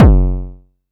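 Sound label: vermona drm1 mk3 bass drum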